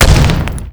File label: rock collide; theodyssey